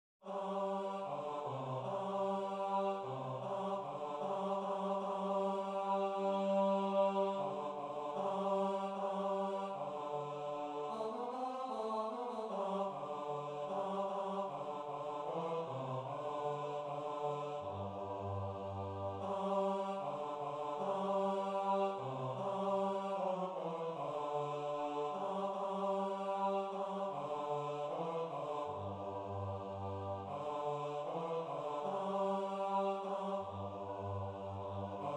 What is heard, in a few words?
bass; Shepherds Arise